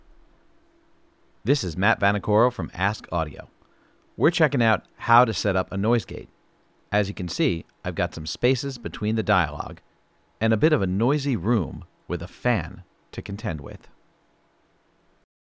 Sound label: noise gate; аудиозапись моего голоса; Для целей урока я сделал ее неаккуратно — вы можете услышать легкий фоновый шум, вызванный работой небольшого вентилятора, гоняющего возду